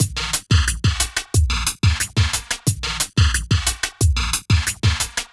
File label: whippy 090bpm, downbeat dance grooves; Zero-G